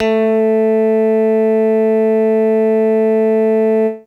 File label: Electric Bass, FAIRLIGHT; Best Service ProSamples vol.55 - Retro Sampler